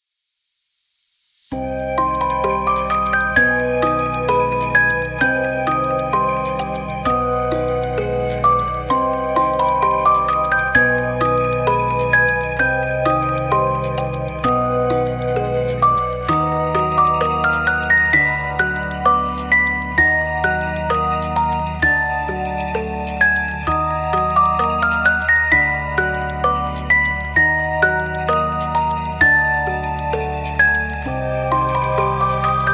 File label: creepy music box halloween music horror scary spooky dark ambient